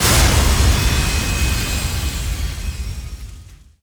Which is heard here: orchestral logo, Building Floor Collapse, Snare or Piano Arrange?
Building Floor Collapse